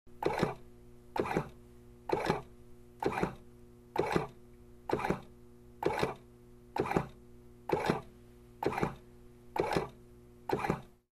Ventilator; Hum, Run, Oxygen Pumping With Bellow Movement; respirator